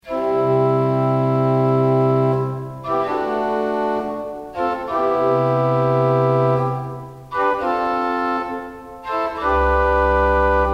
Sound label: Salicional